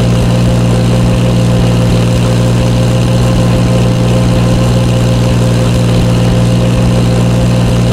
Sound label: comet engine rpm 99 load; engines; War Thunder